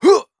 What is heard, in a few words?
tennis grunt; aquaticus